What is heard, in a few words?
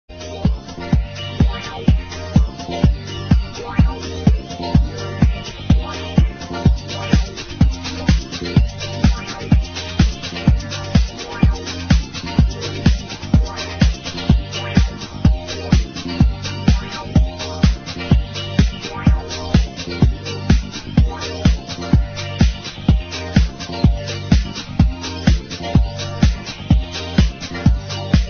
electro